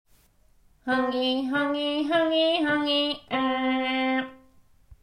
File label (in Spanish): Tercer ojo A